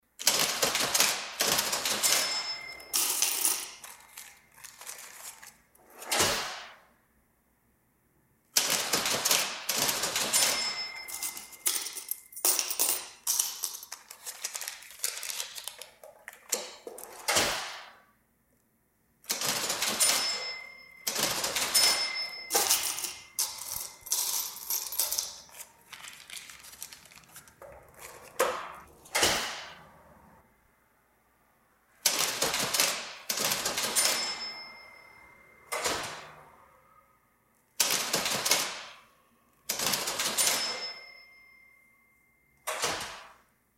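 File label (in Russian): касса с купюрами и монетами в большом помещении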